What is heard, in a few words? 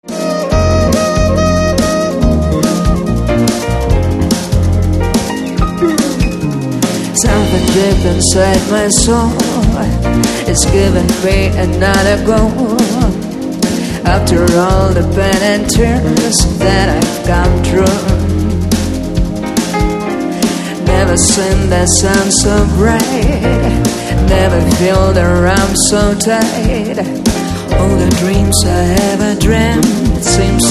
piano studio